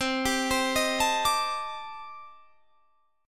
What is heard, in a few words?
C Minor 6th Add 9th C Guitar Standard; Cm6add9 arpeggiated; JGuitar